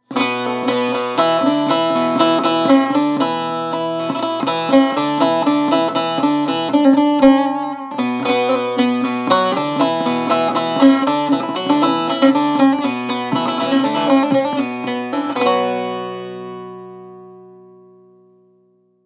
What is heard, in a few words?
Saz Baglama